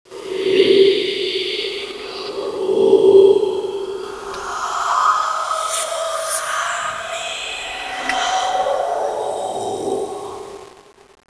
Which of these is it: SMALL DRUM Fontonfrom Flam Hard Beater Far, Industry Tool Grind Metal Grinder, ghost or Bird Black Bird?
ghost